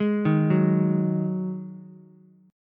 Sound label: Eb-Minor Double Flat 5th-Eb-1,3,2,x-8-down-Ukulele; Ebmbb5 arpeggiated; JGuitar